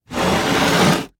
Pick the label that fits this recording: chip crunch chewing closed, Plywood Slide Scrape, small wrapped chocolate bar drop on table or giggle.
Plywood Slide Scrape